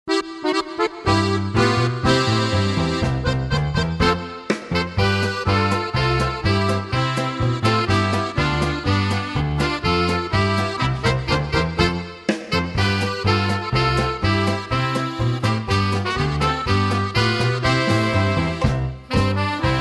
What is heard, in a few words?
Polka